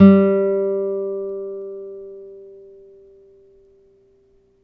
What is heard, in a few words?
NYLON P G2, GUITARS; Synclavier Sampler Library